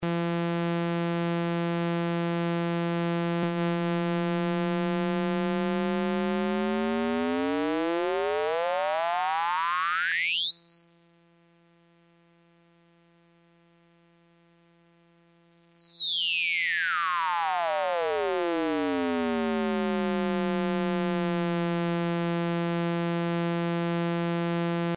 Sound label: Serge Exp Shift, Frequency Shifter; SergeModular; Frequency Shifter Samples